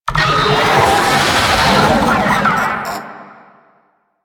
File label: Proto Infested Babau Idle, Vocals